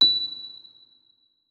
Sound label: notes, keyboard, electric piano